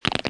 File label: bug Crip; merge-dragons-2